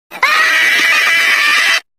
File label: screaming